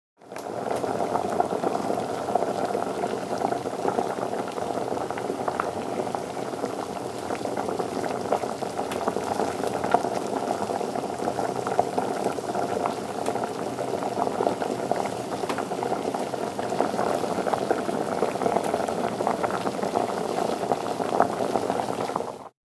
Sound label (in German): Wasser kocht